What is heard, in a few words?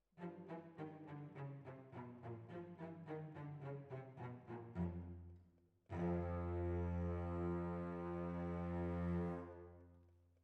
Violas, jazz for piano